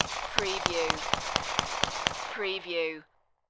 Pistol S W P99 40 Cal Multiple Shots Exterior At 100ft